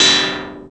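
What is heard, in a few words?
PERCUSSION METAL, Techno Perc; Roland L-CD701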